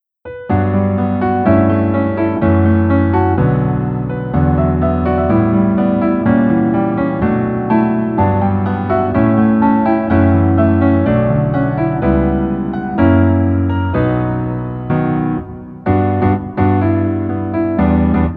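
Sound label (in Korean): Piano version 멜로디 MR 남자키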